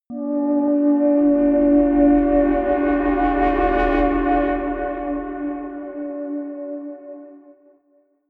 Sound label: soft hum drone, drones